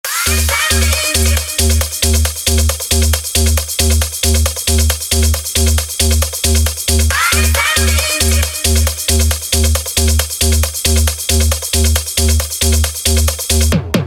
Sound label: CLUB MIX